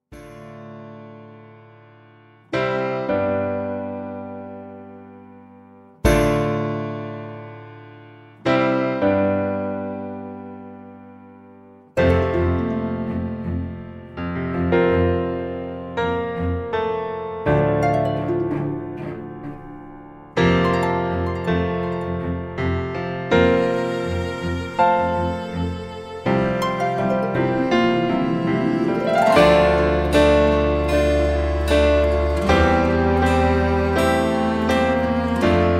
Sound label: karaoke instrumental